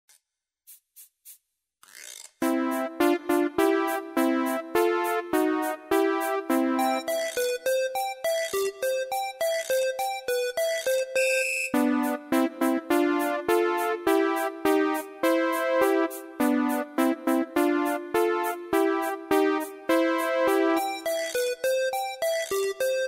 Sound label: song cuckoo